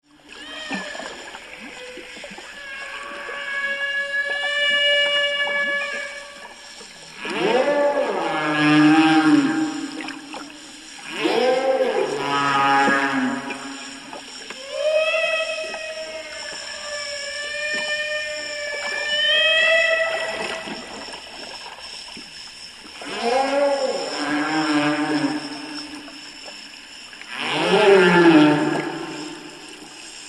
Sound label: Humpback Song, Sitka